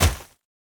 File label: Racket Snowball Hit